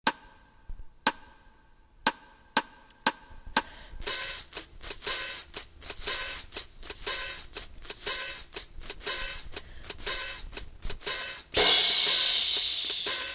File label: jazz instruments; singing